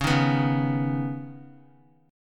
C sharp-7th Suspended 2nd-C sharp-x,4,3,4,1,x-1-down-Guitar; C#7sus2; strummed; JGuitar